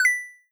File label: coin get high